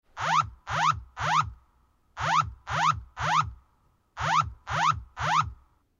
area Mail alert

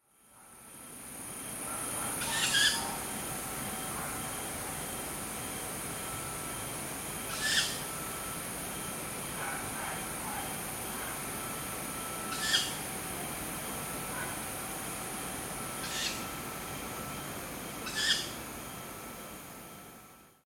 owl young pair

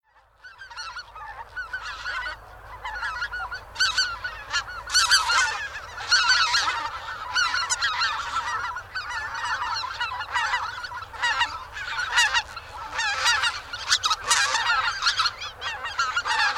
magan flyby

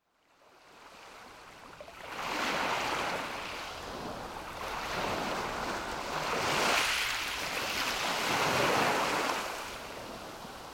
wave; arao

